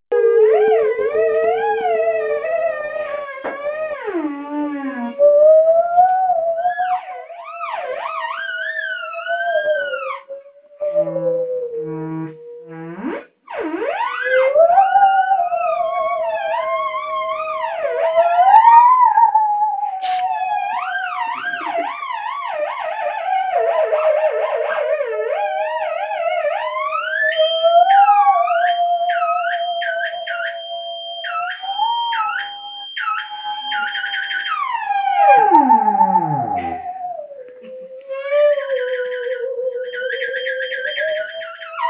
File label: theremin